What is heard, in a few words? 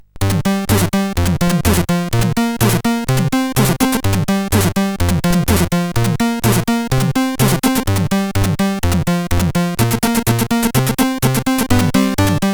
Quick Tune, CSG8580